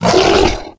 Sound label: hit, bloodsucker; sven; russian sounds